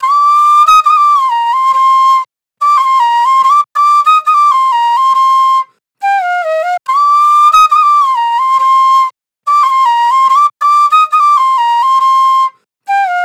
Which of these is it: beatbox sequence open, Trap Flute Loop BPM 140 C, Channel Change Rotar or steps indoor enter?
Trap Flute Loop BPM 140 C